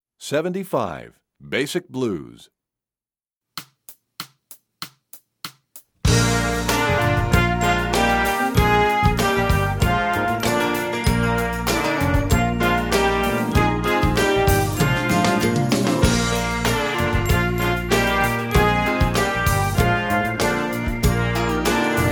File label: Basic Blues; EE2000 Book1